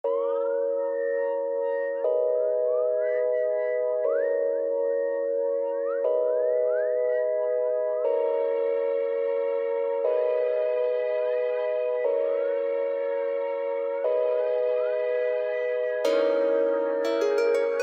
120 bpm

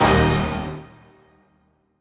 chords hit Eb

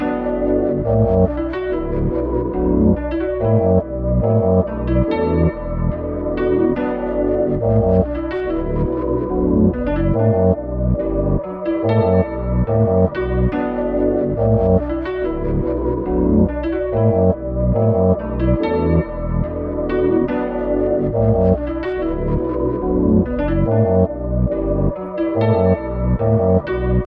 71bpm

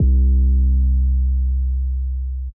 Bass; 808s

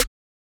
Open Snares

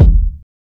OLD KICK

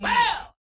Chant

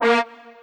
Sounds & Instruments

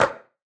Clap

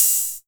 OpHat, Open Hats

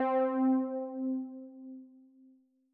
Boredom Lead

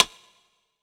Rim, Percs

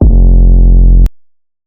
Not Nice 808's

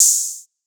Open Hat

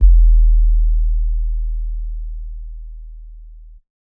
808s

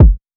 kick one shot puff; Kicks

